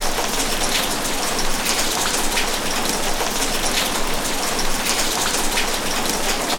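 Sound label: weather rain medium drips street; sound effects one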